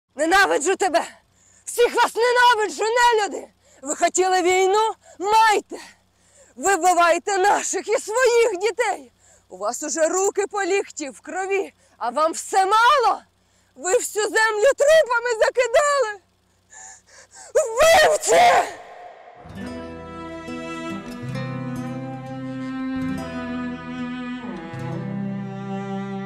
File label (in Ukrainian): вокальний колектив